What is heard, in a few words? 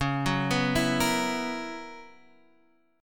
B Major 7th Suspended 2nd Suspended 4th Db